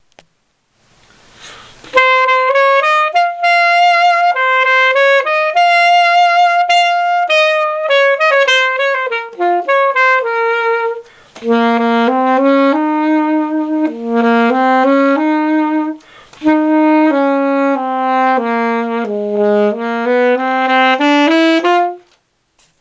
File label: Sax line